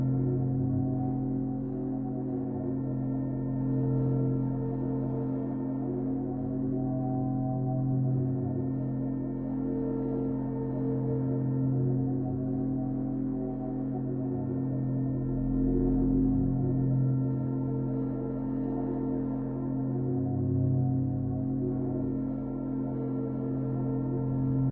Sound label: editor drone; Source Engine; Portal 2